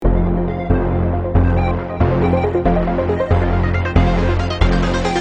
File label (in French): loop synthe